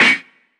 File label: Heavy Snare; Zero-G Sound Sense Grime Scene; GS Kits